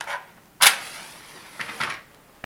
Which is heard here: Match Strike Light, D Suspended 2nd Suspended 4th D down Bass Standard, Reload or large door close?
Match Strike Light